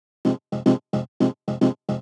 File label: 110 Fm, Melodic Loops; M8; Electronisounds